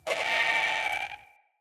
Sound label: Creature; Corrupted Ode